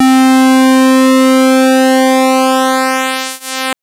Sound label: Synth Sid Pulse c3